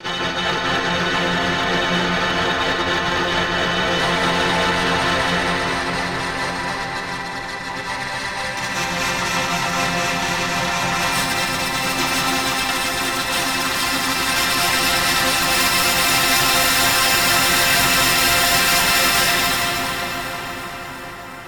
Granular synthese; Emu EIV classic und Ultra; E4xt Ultra Fx

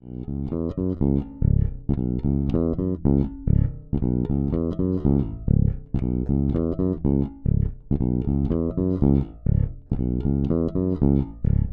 Lakland 55 94 Deluxe Bridge Pickup Seriell

